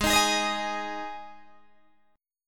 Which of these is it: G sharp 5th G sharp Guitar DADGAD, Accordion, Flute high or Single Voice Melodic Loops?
G sharp 5th G sharp Guitar DADGAD